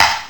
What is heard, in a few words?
HC-2 Clap; Boss HC-2